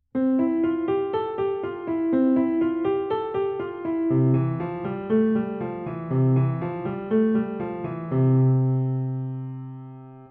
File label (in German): Spreizen der Finger Übung